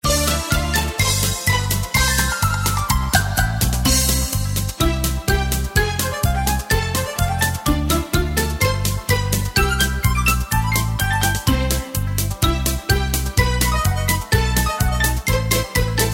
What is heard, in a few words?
music